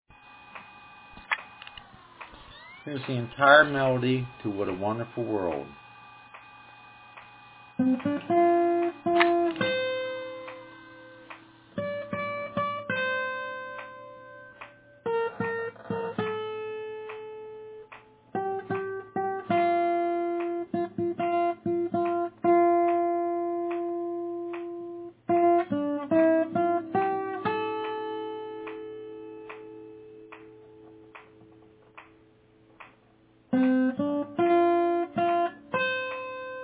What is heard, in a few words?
Melody